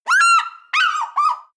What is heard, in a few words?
eagle african fish call; natur tiere; voegel